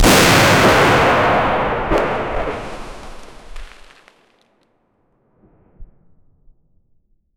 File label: real stun grenade explosi